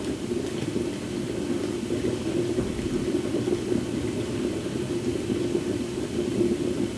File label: cooking